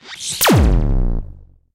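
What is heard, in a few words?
enemy shot laser; AssaultCube; Alien Stars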